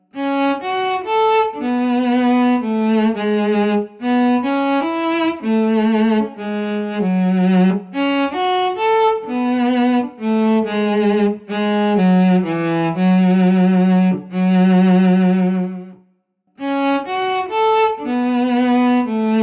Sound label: Cello practice